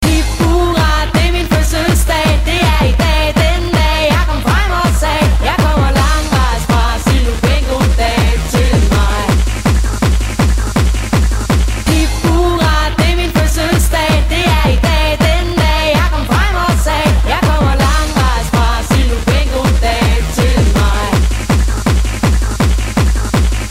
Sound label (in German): Hip Hurra